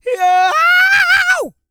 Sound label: E YELL, MALE HUMAN; ILIO; Vocal Planet